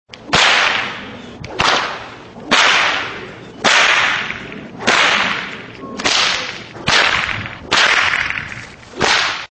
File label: whip crack